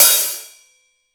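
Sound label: HI-HAT CLOSED; Greytsounds; HI-HATS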